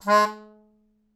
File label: Melodica Short; Short Notes Chromatic